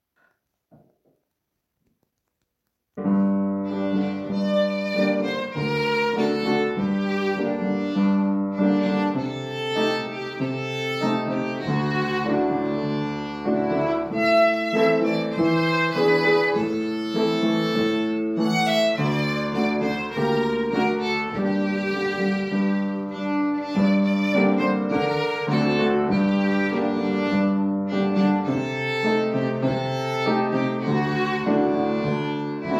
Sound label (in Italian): Tema flamenco per violino e pianoforte tradizionale